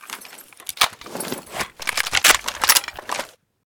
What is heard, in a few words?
reload empty; weapons; mdr